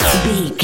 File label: sci-fi weapon blaster laser fun